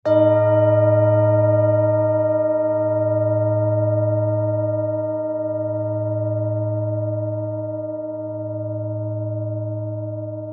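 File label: tibetan bowl; neptunset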